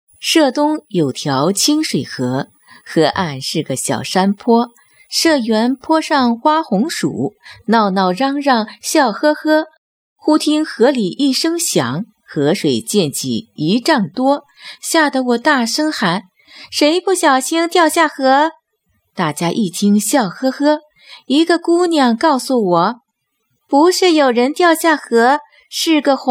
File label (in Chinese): 民谣